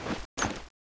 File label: chain mg clip on, weapons